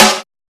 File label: snare sound g sharp key; snare drum samples; one shots